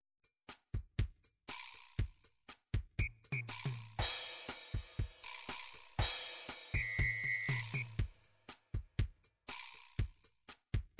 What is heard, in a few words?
drums, midi drums